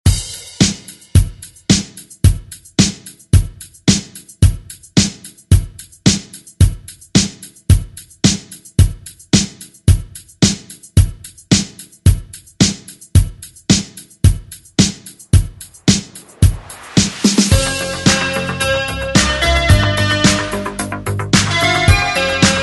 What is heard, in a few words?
Re Drum; DJ Mhark